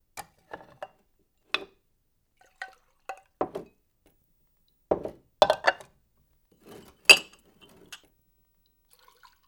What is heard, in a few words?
Coffee Preparation Sequence